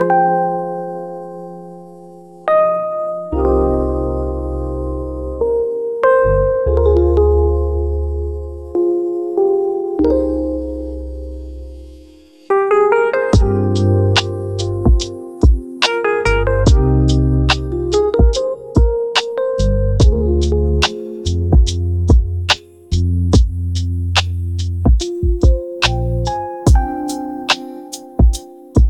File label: rainy lofi